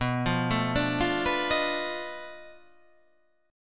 B 7th Sharp 9th Flat 5th, down

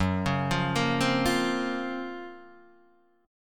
Gb Major Sharp 11th, Guitar Standard; GbM#11 arpeggiated

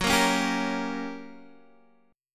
G Minor Major 7th Flat 5th, Gb; strummed; JGuitar

